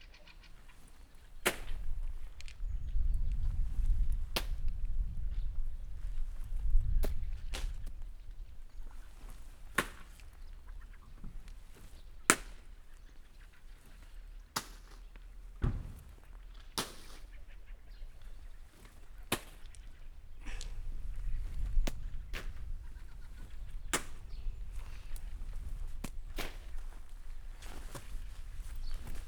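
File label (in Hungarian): diofalombban varboc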